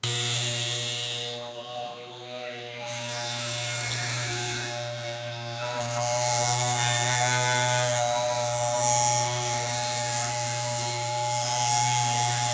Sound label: indian barber shop with